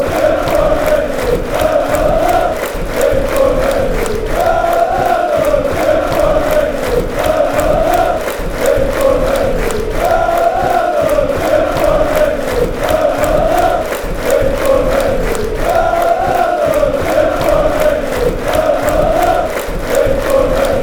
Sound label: lalalala, fan chants; tim cornelisse